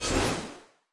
Event Progress Particles Appear; subway surfers city sound effects

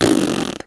Brr

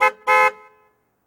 Car Beep; d4dj groovy mix; android

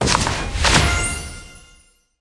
Campaign Ticket Added; subway surfers city sound effects; Android, iOS

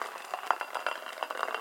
Rolling

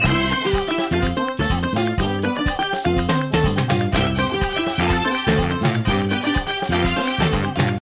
Theme Loop; Dora the Explorer: Dora Saves the Crystal Kingdom

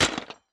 Break Plastic

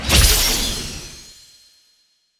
Superball Shot; Disney Star Smash; Android, iOS, Mobile

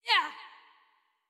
Charge Release; Jackie; Going Under

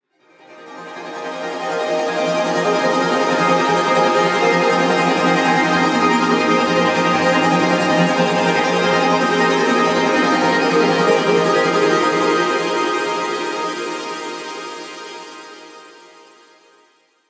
Pad Sparkly F; exciplex sample presets pack; JinoBeats

